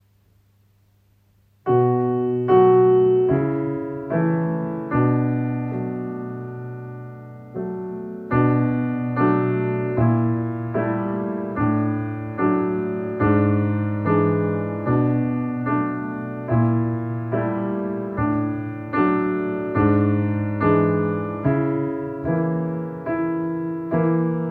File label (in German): Klavier